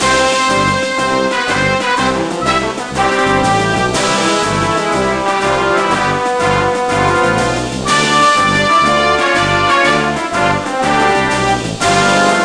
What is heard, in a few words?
March